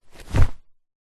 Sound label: foley clothing shorts thick cotton drop carpet